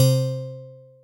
Octavestring, Collision, Mallets; Ableton; Factory Packs; Synth Essentials

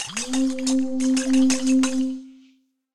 Alp Cow Flute; Instruments; Ableton; Factory Packs; Lost and Found

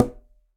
Conga B Tip; Latin Percussion; Drums; Drum Hits; Ableton; Factory Packs

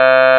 android, Digital Synths; Ableton Foundation FreePacks

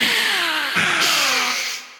Metal Mouth, Transformed Voices; Chromatic Voices; Ableton; Factory Packs; Voice Box

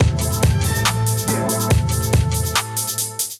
Kit groove 141 bpm, Drums; MIDI Clips; Safe House; Ableton; Chop and Swing

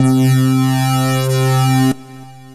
Grey Panel Synth; Synth Lead; Ableton; Factory Packs; Chop and Swing

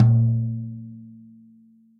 FLOORTOM 1412 JAZZ stick center

